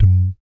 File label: BASS DUM; Spectrasonics; Vocal Planet; Jazz FX